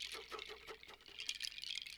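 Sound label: Music Village Calm Near; From Dust; ps3; xbox 360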